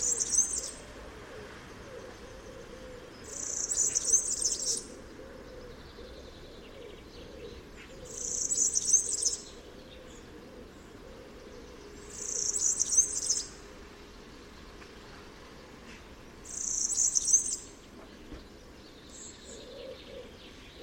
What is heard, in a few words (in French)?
serin cini; chant